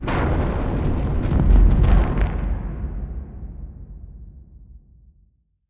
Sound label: astroid, ambient; Stellaris